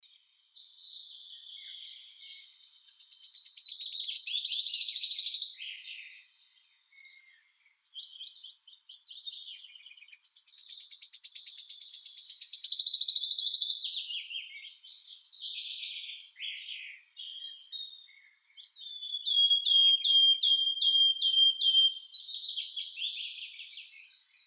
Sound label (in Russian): penochka treshotka phylloscopus sibilatrix